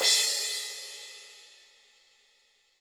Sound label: HiHat & Crash, Roland TD-20; HiHats